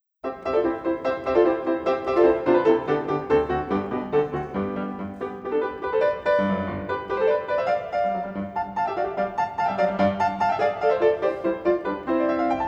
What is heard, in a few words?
Piano Concerto Rondo; Beethoven; Piano Concerto 1